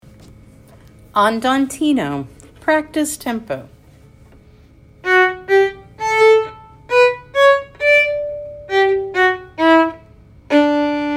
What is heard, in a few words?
Andantino practice tempo